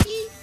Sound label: Eee; Weird